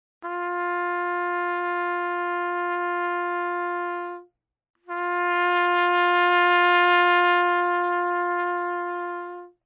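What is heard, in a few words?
Vibrato, trumpet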